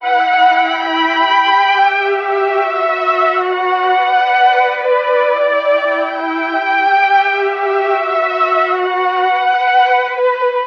strings, Sad

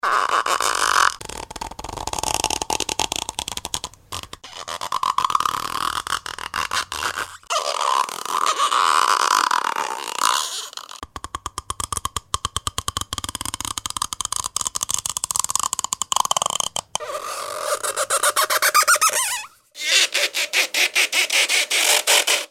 creak stretching

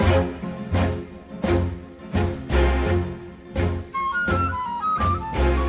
flute, Loops Phonky

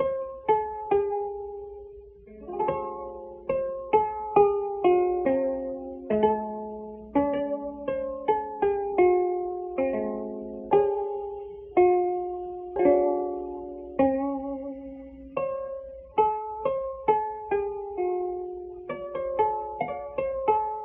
keys, jazz loops

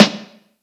Snares, Real

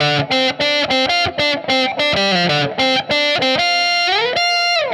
guitar, Loops, Phonky

